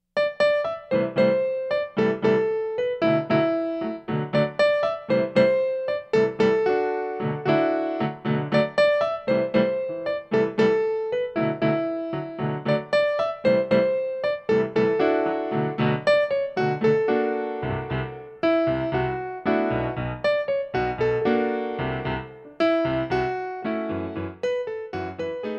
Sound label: Brazilian Rhythms